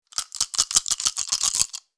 Dice shaking slow board game

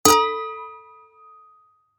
Singing glass impact single hit

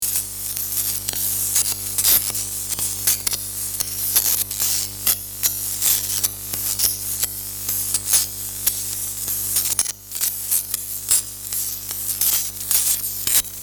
Unstable electrical current electricity buzzing; sound design effects

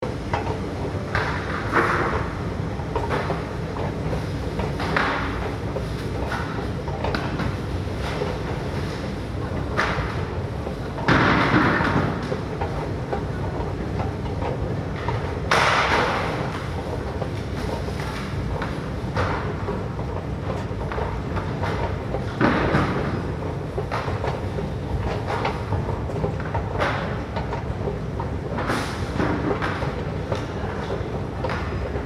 Construction site heavy machinery ambience

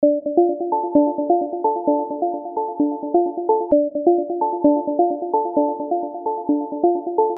Infographic call ringing tone loop

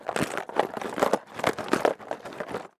Jigsaw puzzle box moving and shaking

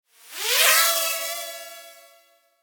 Drone toy takeoff fly away whir; rc toys

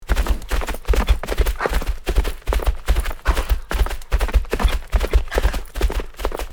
Fast horse running close perspective

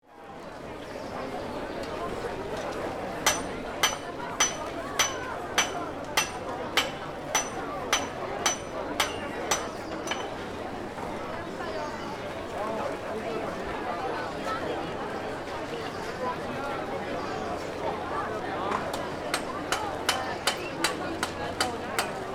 Flea market activity noises vendors and people

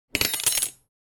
Dropping a knife onto a hard surface heavy impact

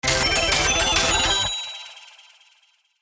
Slot machine casino bonus round intro

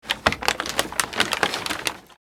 Christmas toys wrapping paper short

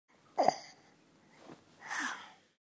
Infant yawning

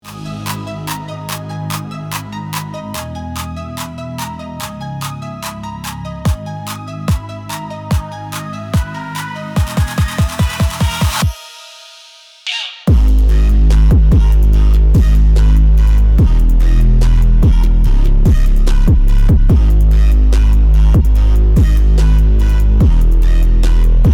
Bass pressure bass boosted moment

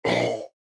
Monster vocalization

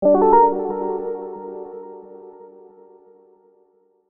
Mistery synth riff

